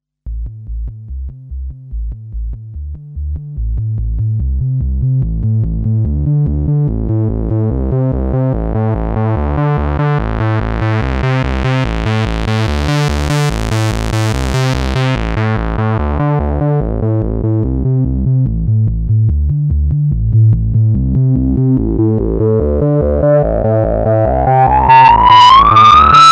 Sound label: Transcendent 2000 Filter Seq